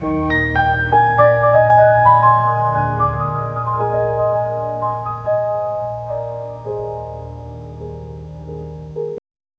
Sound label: romantic ui reward soundb